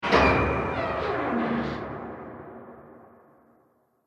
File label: Haunted House Door Open, Creepy Door Sound Effects